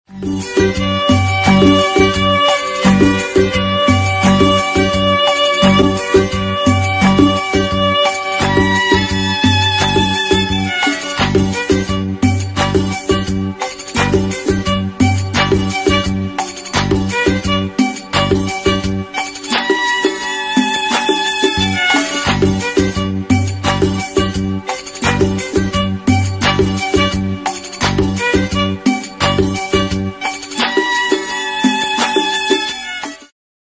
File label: violin part; movie taal